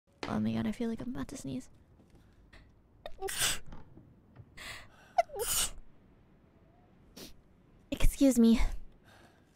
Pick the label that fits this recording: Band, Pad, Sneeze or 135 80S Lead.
Sneeze